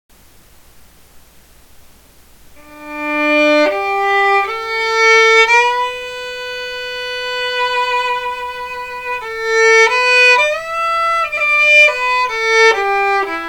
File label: Violinist; Mr. J violin solo